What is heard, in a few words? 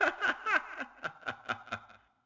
Intro Laugh; the princess and the frog; Restaurant; Laughter Adult